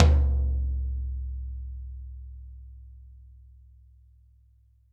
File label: Toms, Drums; Bob Clearmountain Drums I